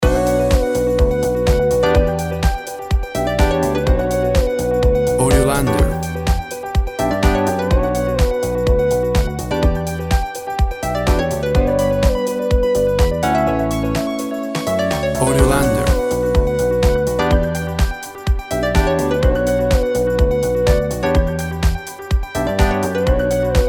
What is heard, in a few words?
Upbeat Grooving Funk Bass House